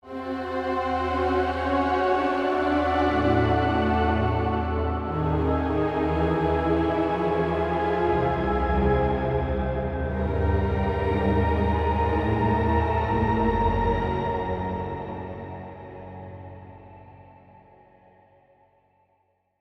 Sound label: Mysterious Event Music